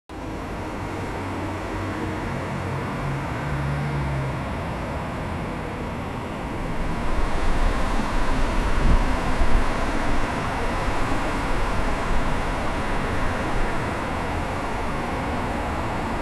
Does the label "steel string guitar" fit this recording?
No